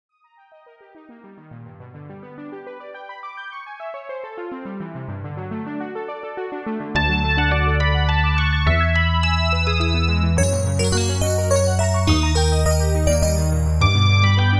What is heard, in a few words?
Duet